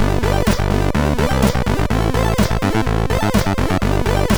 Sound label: tune sid MOS6581R3